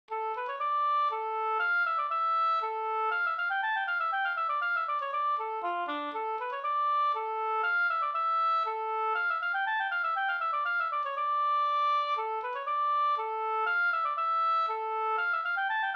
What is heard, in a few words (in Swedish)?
polska dmoll-polska